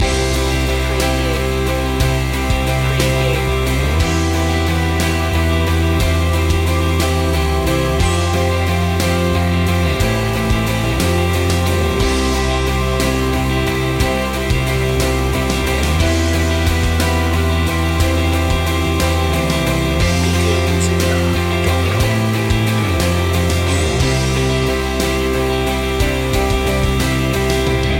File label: over and over loop